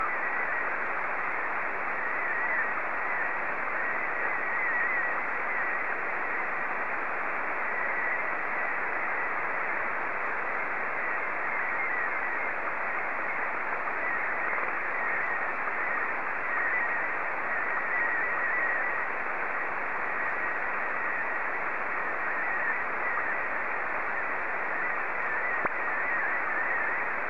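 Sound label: Robot 72, slow-scan television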